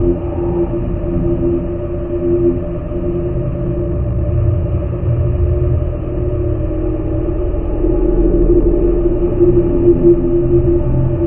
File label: amb spooky; dm subchamber